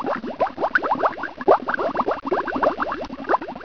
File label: Water, 水声; 特酷声音素材库